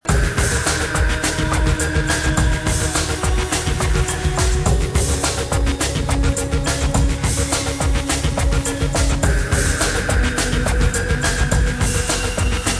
menu music defeated

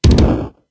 Explosion; Kairobotica

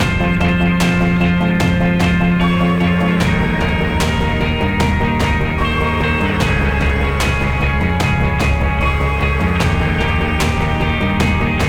boss battle; Griftlands

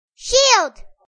Shield; Chibi Knight

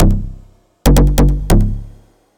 Hard Bass Complex

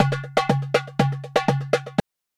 Intense Perc

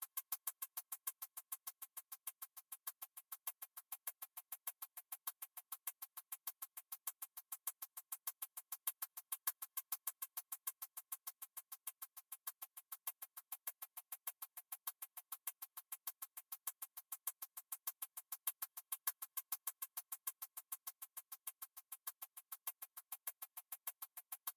Tension Curve

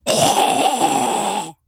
Grave Zombie attack

